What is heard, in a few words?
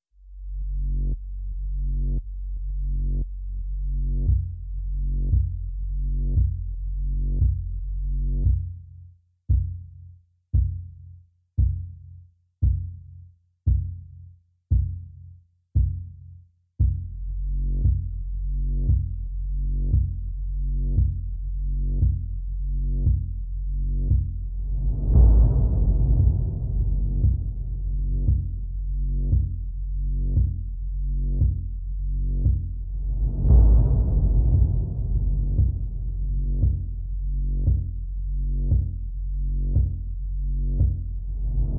Drum and Bass